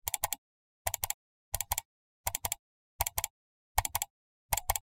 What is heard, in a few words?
Multiple Mouse Click